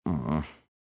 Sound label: tap